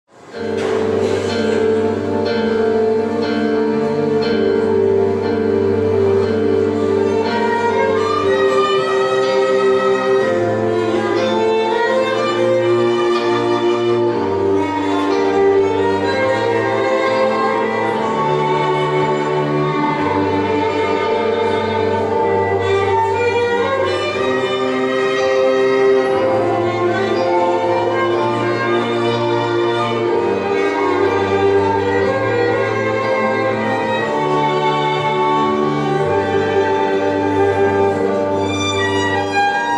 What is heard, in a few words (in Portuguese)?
ADAGIO QUARTETO DE CORDAS E TECLADO